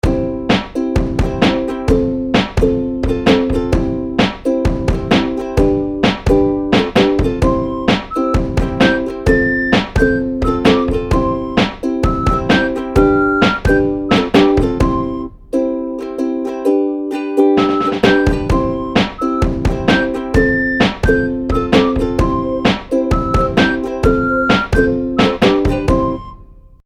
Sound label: Hi Five Ukelele